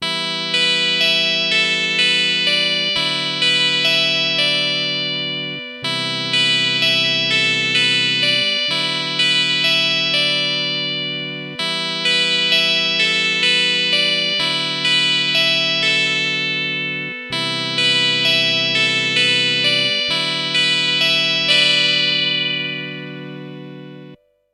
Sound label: Hammond SK1 Vox Conti; Lucy